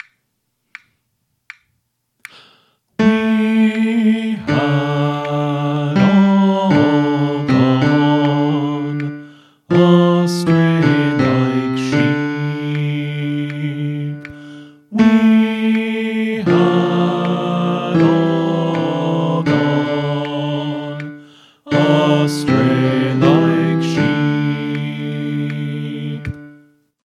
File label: Refrain Bass